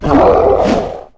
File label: Attack; Naipin